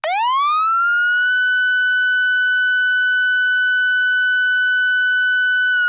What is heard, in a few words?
Federal Signal PA300 Yelp / Federal Signal Omega 90 Airhorn